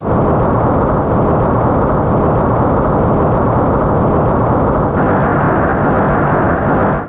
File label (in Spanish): terremoto